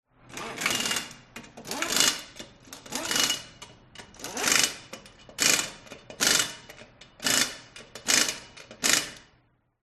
industrial mechanic tighten nuts wheel car impact wrench